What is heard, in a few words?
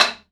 stick